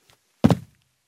Jump in shoes on a wooden carpeted floor; steps

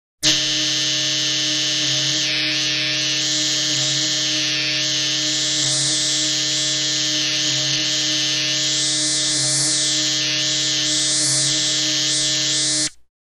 Electronic signal; radio signals

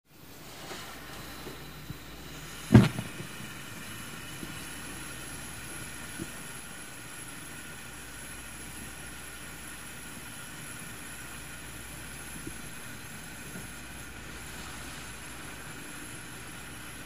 Ignition of a burner on a gas stove; fire

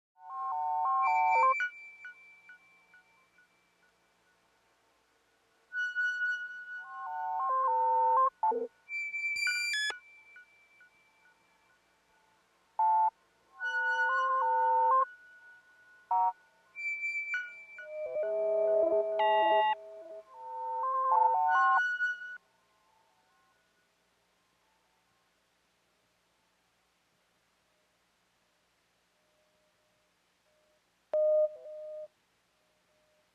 Electronic communication signals, distress signal; radio signals

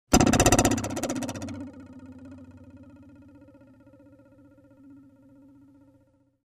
Arrow trembles stuck in a tree; cold weapons

